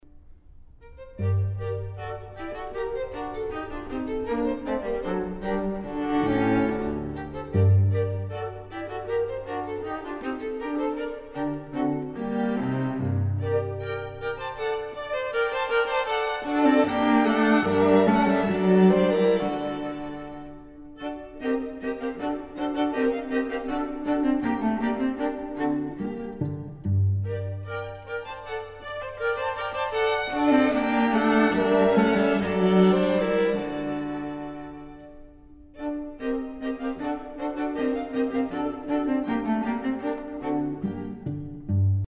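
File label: romance for quartet; sullivan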